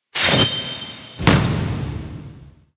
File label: UI Prebattle